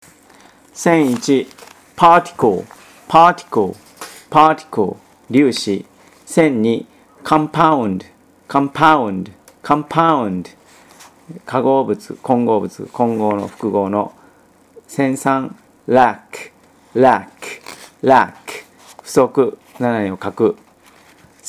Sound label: tango